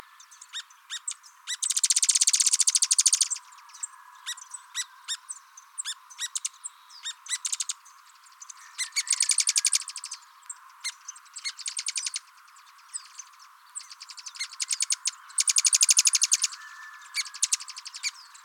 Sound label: striped sparrow